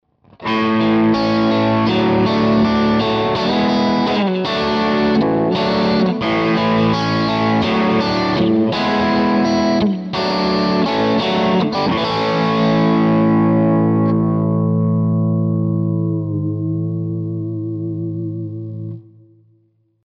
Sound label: Neck Middle Parallel Crunch; Ibanez AZ226